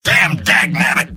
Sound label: negative vocalization; engineer; video games; source engine; tf; mvm